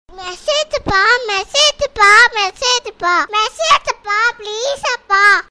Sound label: Baby Msg Tone